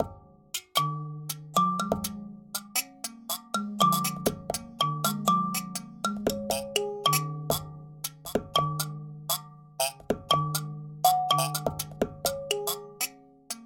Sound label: kalimba; best service ethno world 5